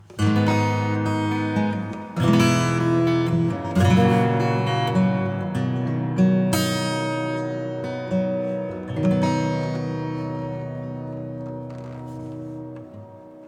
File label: acoustic; MorphVerb